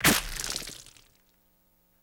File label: splat; E-MU Producer Series Vol. 3 – Hollywood Sound Effects; Science Fiction; Pumpkin Head